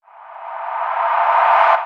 reverse, FX; Vengeance Samples

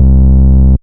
Bass Cuts; Vengeance Samples; VES1 Instruments

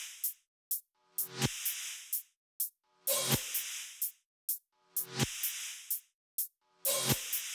127BPM Kit; Vengeance Samples; Com Pop Kit

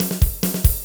Fill 140 BPM

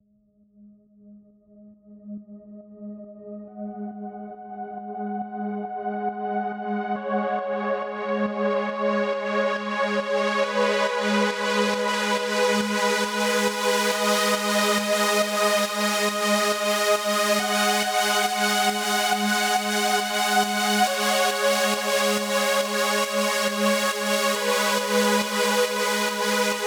Pad Full 138BPM, G# minor

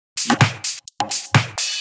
Electro Loop 128 BPM; Vengeance Samples

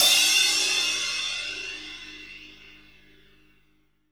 Cymbals crash; Vengeance Samples

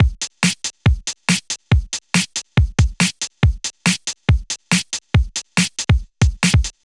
Loops BB 140, BreakBeats; Vengeance Samples

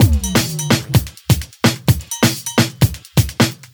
House Beat, 128 BPM

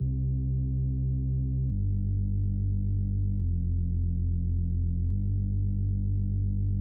Sub Bass, 141 BPM; Vengeance Samples; Once Upon A Time

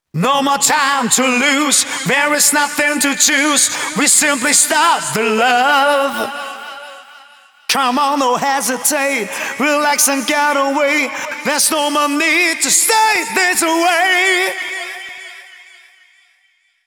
Male Vocals Vers WET, 128 BPM; Vengeance Samples; VTDS2 Song Kits

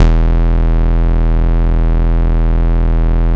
Bass Long E; Vengeance Samples; Long Basses

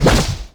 FX Scratching; Vengeance Samples

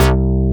Basses Root C; Vengeance Samples

